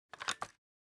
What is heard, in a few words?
movement sniper; gmod; arccw uc commons attachments